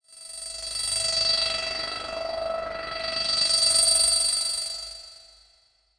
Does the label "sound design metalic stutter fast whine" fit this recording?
Yes